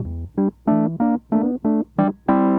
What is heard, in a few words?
wurly, analog funk; Mixed Berry Jam; Sonic Foundry (Sony Creative Software) - What it Is! 70s Analog Funk